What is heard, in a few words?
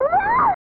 ''Iyaaaaaaa!''